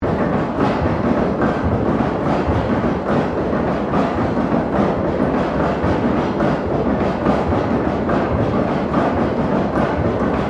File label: Battery Steam Driven 10 Head Operating Loop